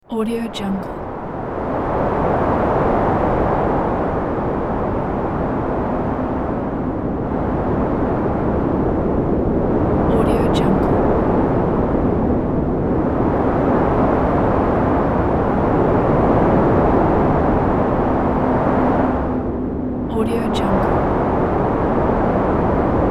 desolate winds

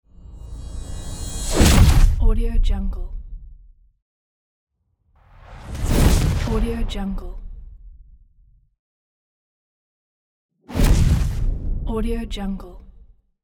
teleportation

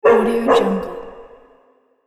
asylum horror guard dogs; sonic-boom

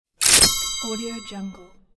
buy

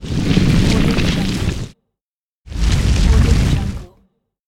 earthquake

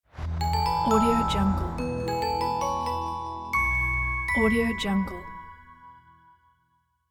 register logo

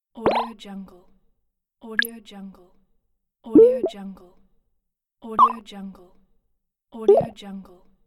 collecting objects in game

